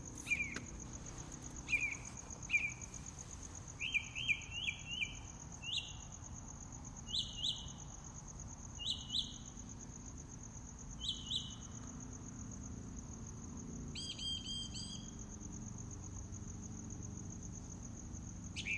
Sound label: mockingbird; Castle Willett